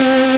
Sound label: Synths Root C